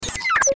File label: Glitch Descending Beeps; Glitch Sound Effects; Production Element Imaging Element Accent Transition